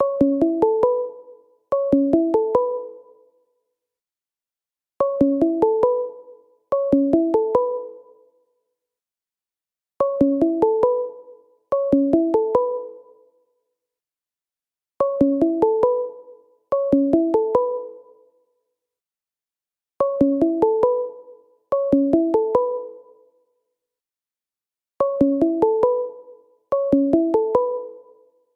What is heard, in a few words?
Xylo Long